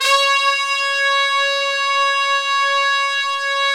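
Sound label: trumpets section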